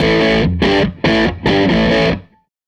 Les Guitar Loop; Sonic Foundry (Sony Creative Software); Six-String Orchestra

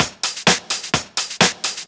Straight Loop 128 BPM, House

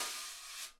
Snare Swipe

